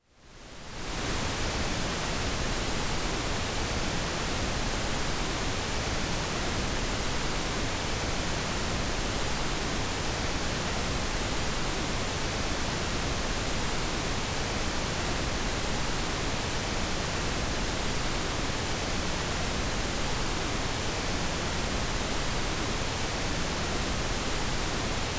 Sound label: uncorrelated pink noise 20 hz to 20 khz; Розовый шум 20 Гц - 20 кГц.; Tестовые сигналы и звуки.